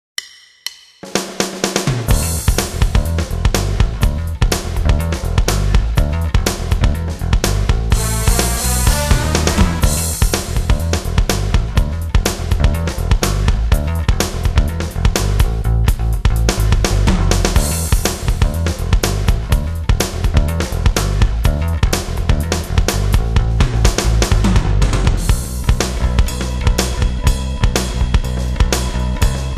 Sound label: Backing; Masterclass II